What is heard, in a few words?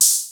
Hi Hat, Cwejman S1 MK2